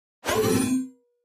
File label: Pop Up HF Elect Whip Sh, pop up element